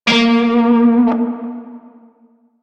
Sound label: Shawzin Flourish Oct Two A Sharp; Music; Warframe; Narmer Shawzin